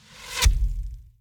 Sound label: Ranger Hail Of Arrows Impact